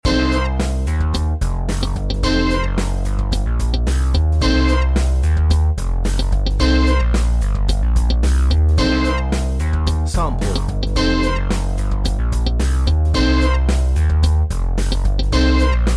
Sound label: funk